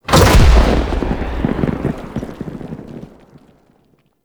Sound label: Interior Orbital Station Pod Eject; Warframe; Tau